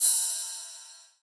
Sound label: Ride; Cymatics; Humble Hip Hop Sample Pack; Cymbals